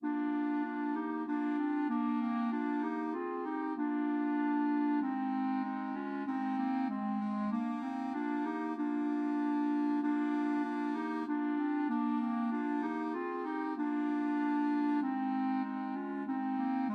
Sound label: Promenade, clarinet trio